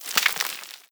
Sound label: debris dirt, bullet impact debris